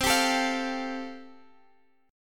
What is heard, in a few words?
C 6th Suspended 4th C, chord